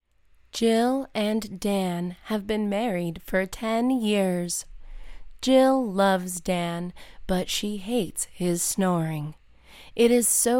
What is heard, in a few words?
Snoring